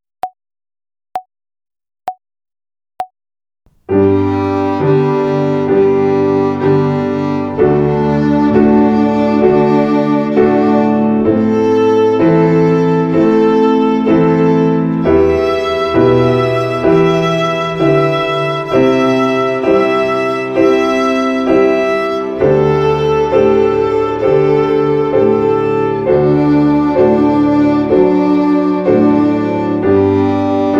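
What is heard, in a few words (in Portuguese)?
Ginástica das cordas soltas com Detaché, Violino; Violino para os Pequeninos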